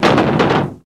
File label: Metal Drops Brief, ransack room